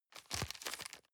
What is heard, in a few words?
plastic bag small turn medium